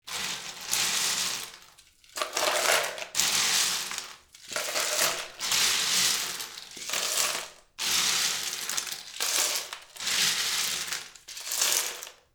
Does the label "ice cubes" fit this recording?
Yes